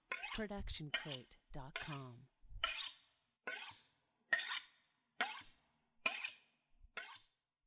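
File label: Sharpening Kitchen Knife; soundscrate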